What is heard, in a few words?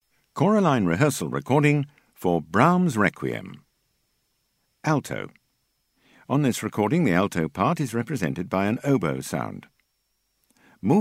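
Alto; Brahms Req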